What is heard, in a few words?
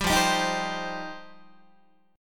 F 7th Suspended 2nd, Guitar; F7sus2; strummed; JGuitar